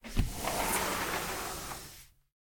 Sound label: door open; Golden Crayon Sounds